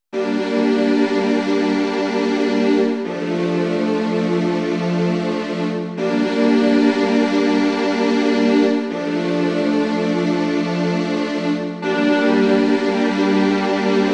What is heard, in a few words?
quiet ringing; depature 2; Kengo 3